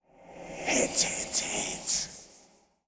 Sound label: Sword idle